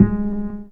BAS TON, SOLID BAS; E-Lab; X Static Goldmine 2; akai

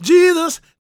GOSP MALE, Vocal Planet Gospel

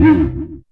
BASS FX; E-Lab; X Static Goldmine 2; akai

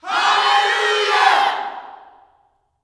"Allelujah", Vocal Gospel yell shout; Spectrasonics; Vocal Planet Vol.1 Gospel; akai

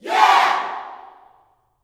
YEAH, Vocal Planet Gospel YELL SHOUT; Spectrasonics; Vocal Planet Vol.1 Gospel; akai

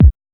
HSE-BD

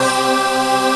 VOICE PAD, ATMO PADS; Best Service; XX Large

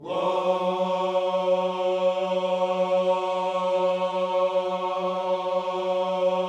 "Whoa", Gospel vocal, F#3; Spectrasonics; Vocal Planet Vol.1 Gospel; akai

